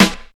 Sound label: clean snare single hit g sharp key, acoustic snare sound tuned to the G# note; snare drum